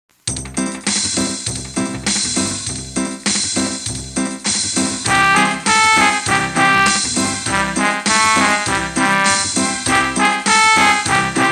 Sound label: Reggae